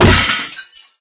robo impact soft; source engine; tf; mvm; physics; video games

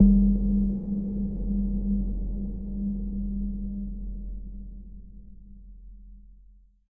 editor blips; Source Engine; Portal 2

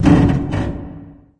lift creak; ambient; source engine; ep2; outland

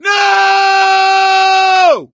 ledge hang fall, gambler, survivor voice; source engine; left 4 dead 2

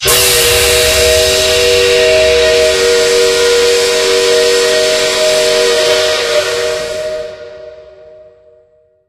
harbor whistle; video games; cp harbor blue; Source Engine; tf

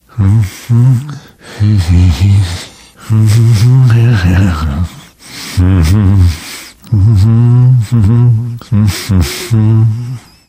nervous humming

